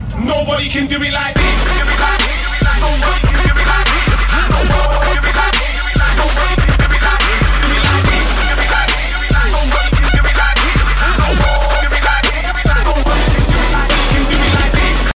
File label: Dubstep